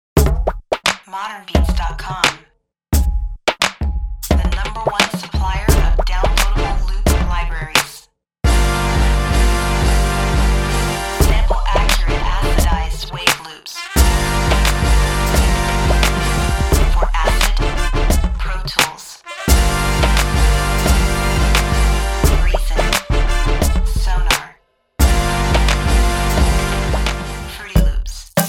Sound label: Ethnic Drum Loops